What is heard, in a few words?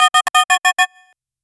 futuristic beep chirplike